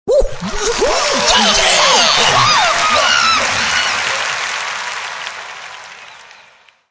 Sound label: woohoos; jungle jiggy